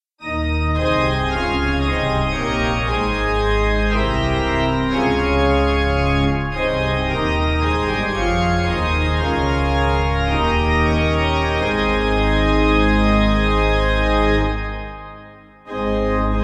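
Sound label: Pipe, hymns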